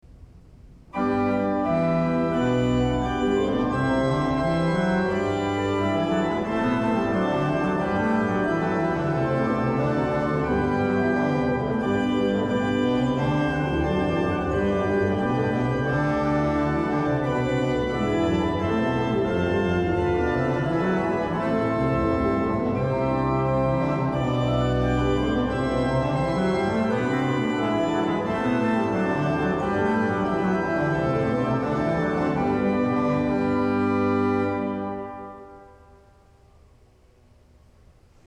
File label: Organ; Jesus Is Captured